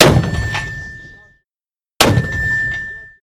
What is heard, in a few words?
M 327 Mortar Shots